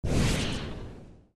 Rocket Launch

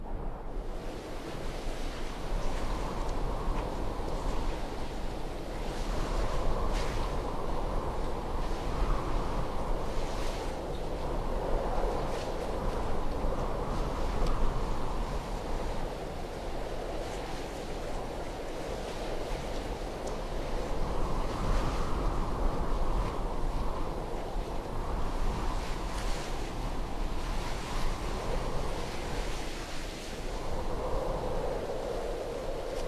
Amb Beach

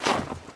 Foot Deep Snow Run; Lucius III

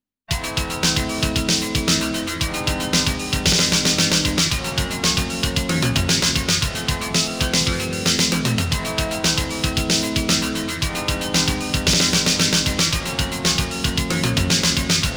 Continue GAME OVER; Plus Alpha

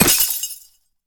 ice spell impact shatter

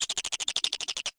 Animals Chatter

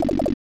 Tile; Super Double Yakuman; SNES

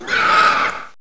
spawn spitter

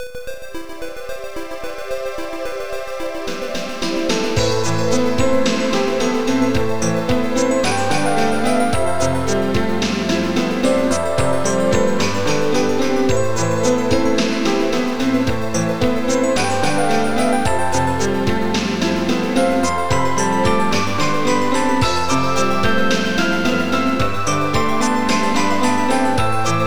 Ending; thunder dragon 2; arcade

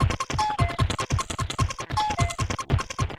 Cartoon

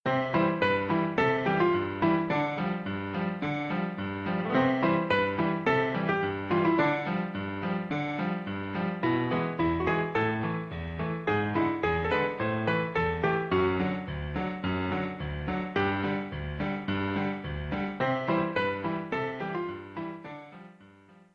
Credits Piano; One Night at Flumpty's

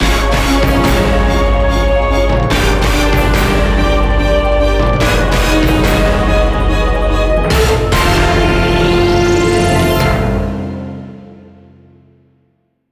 Jingle Huge Complite

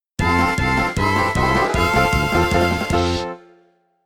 Horse Race Goal

